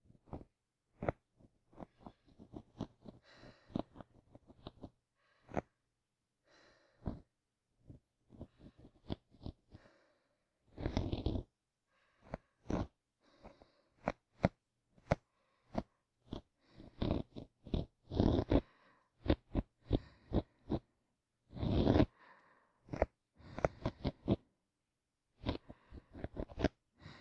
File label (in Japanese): お狐ちゃんの安眠用寝息＆耳かき音左